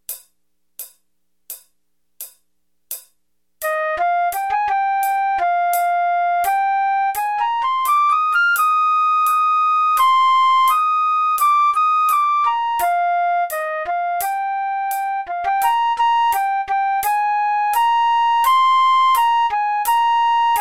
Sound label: flute and horns no track; Flute and Horns - No Vocals; Rivercrest Instrumental Music